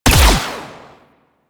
Energy Ball Fire; Mine Osprey

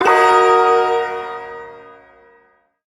Ersatz Shawzin Yo Chord C; Warframe; Duviri

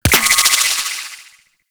Shot, Weapons, Sentinel; Warframe; Gremlin

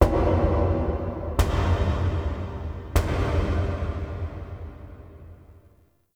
Knocks Large; Ambience; Warframe; Entrati

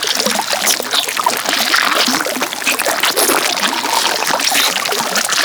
Infested Diseased Ancient Spawn Fluid Loop

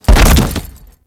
Grineer Light Fall, Body Falls; Warframe

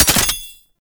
gunbrella mech; Weapons; Warframe; Tenno; Koumei

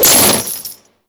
Chains Beam; Condemn; Warframe; Priest

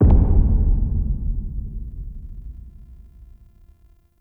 Sub Hit; Warframe; Shadowgrapher

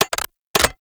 Arch Gun Alt Charge Mech; Weapons; Warframe; Archwing; Hildryn

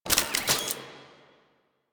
Alt Mech, Blastor Pistol; Warframe; Weapons; Corpus; Plinx

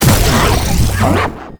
Infested Lich Virulence Cast; Warframe; Abilities; Zeke

